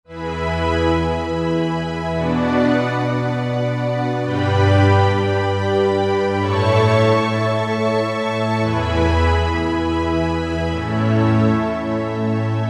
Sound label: JP-8 Str 3, Roland JV-2080